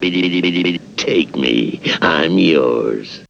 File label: VOX; ZERO-G; Datafile